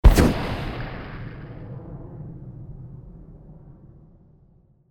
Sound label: explosion